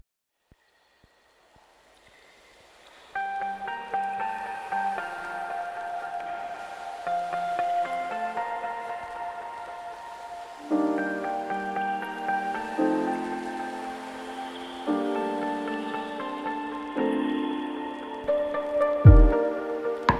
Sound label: instrumental lofi